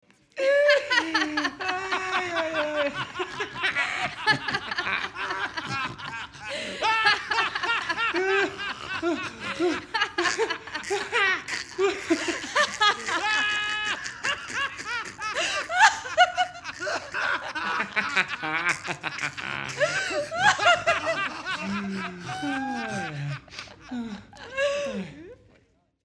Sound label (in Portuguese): Shuffle De Riso, Risadas Individuais